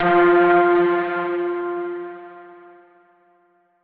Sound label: chime; chime sharp